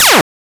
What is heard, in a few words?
Laser